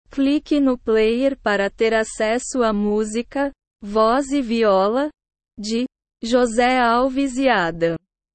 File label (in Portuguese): voz e viola